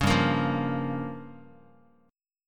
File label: G Minor 7th Flat 5th, Guitar; Gm7b5 strummed; JGuitar